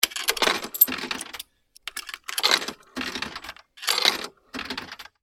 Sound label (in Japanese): 自販機 お金投入連続